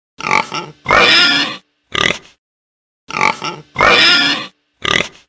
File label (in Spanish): sonido cerdo